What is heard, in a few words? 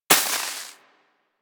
Bullet Impact Debris Snow